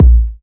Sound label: tight low end steel kick drum sound a sharp key